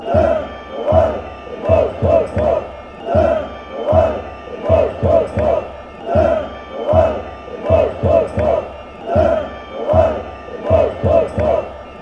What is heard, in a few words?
bo bo bo, fanchants